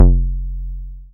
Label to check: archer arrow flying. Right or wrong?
Wrong